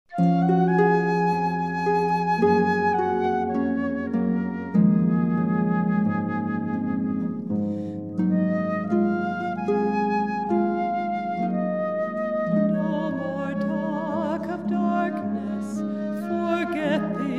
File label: harp flute voice bass cocktail; Wedding